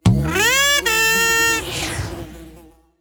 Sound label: gambler lose; gambling; Beauty Fruity; wazdan